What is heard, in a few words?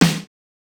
snare, snare house